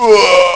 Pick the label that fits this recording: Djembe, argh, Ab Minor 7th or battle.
argh